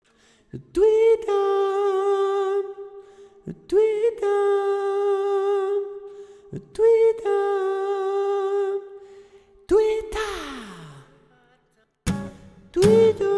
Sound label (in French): blues rythm; Voix / Rythme 2 seule